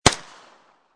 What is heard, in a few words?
MP5 9mm Barrel; Machine Gun; Machine Guns; VRSonic